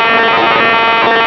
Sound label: cellular; AOR AR8200; scanner sound clips; Quiet Designs Inc.